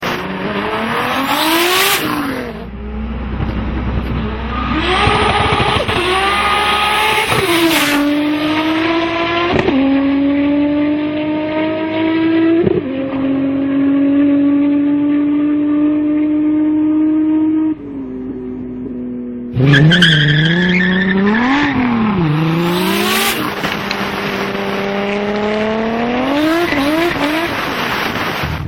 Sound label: Car Sound